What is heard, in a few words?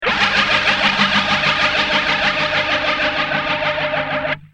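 guitar; mxr psd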